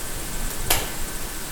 Colpo; Forte Belvedere

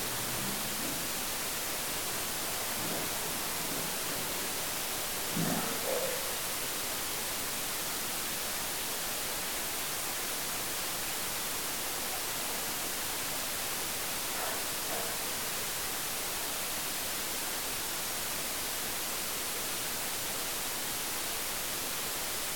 Passi rumori caduta di oggetti; Bogoni

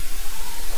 Vocalizzo bambina; Bogoni

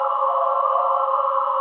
voce coro femminile

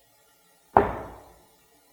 colpo Toc; Castello Monselice

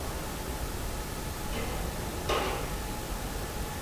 Colpi vicino microfono; Castello Marostica

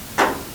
Colpo vicinissimo; VillaMussolini

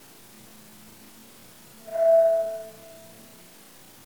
rintocco di campana; Castello Monselice

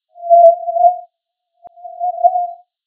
urlo pianto; Castello Monselice

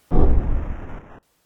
Presunta esplosione di una bomba; Forte Belvedere

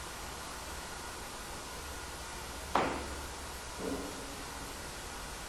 Colpo e presunto trascinamento di sedia; Castello Inferiore Marostica

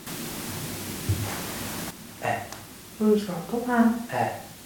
Colpo avvertito dai presenti; Bogoni

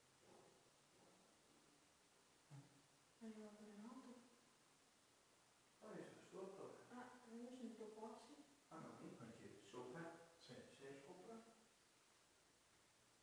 Passi; sempre stato lontano dalla torretta; CastelloEste

